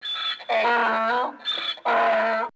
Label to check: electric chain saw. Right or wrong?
Wrong